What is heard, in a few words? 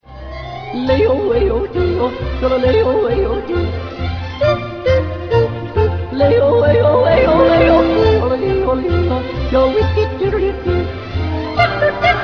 fiddle